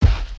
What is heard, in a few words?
Spider Footstep; creatures organic